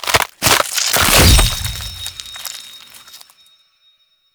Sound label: Archon Finisher Rip Gem; Warframe; The New War; Boreal